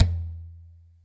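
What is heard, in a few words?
TOM soft; Zero-G; Chemical Beats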